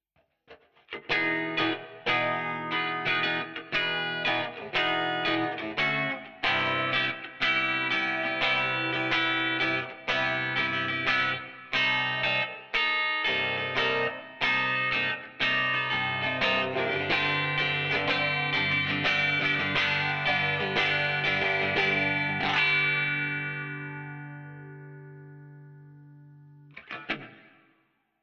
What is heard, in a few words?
E-Guit Clean Chorus Wha